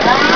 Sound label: saw on; ParoxysmII; weapons